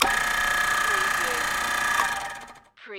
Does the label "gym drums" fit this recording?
No